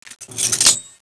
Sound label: frost knife deploy